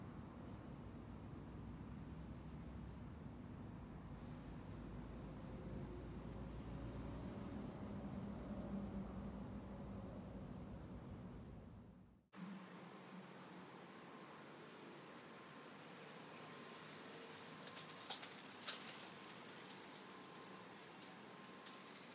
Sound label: onsite day night sounds